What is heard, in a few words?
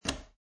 Poker Chips Place One Chip Onto Another On Wood Table; Gambling